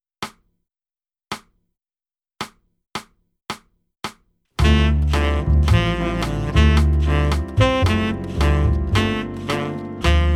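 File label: Backing with click, Concert Backing Tracks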